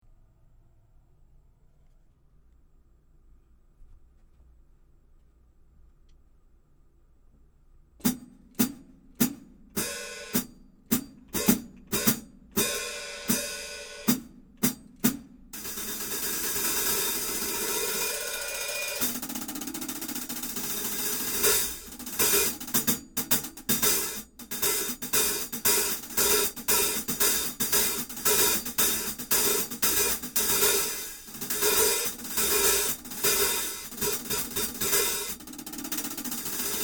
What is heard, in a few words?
K Custom Dark HH 14